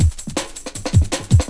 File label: break